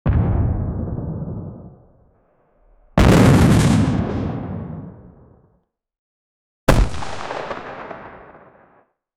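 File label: war rocket launch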